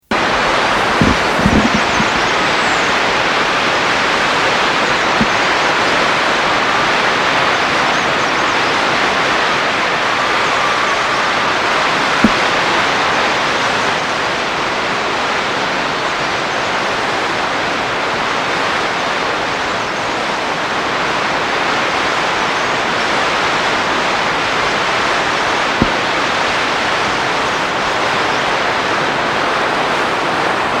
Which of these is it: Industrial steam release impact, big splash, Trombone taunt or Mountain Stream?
Mountain Stream